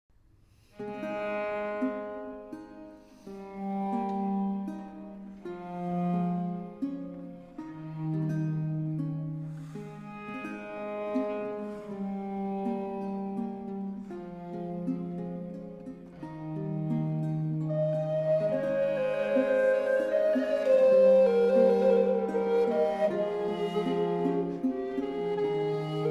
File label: For Recorder, Lute and Cello